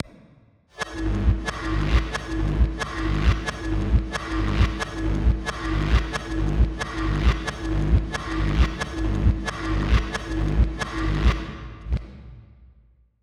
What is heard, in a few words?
Rev Loop, 90, Ab